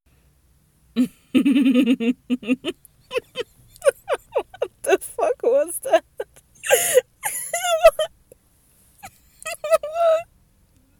F, attempted evil laugh; What the fck was that

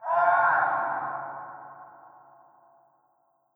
city scream; War Ambience

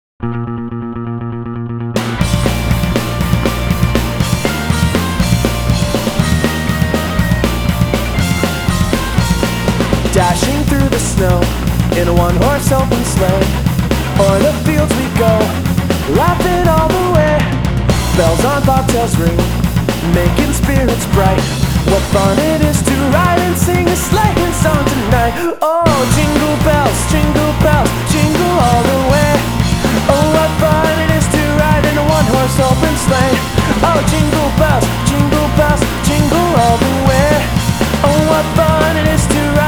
Jingle Bells

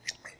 Sex Slide

